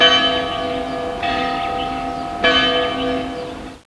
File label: church bell; Princess and the Pea; SoftKey